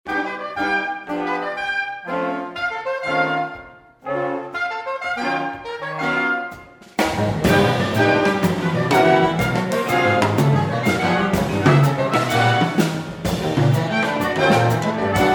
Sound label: baryton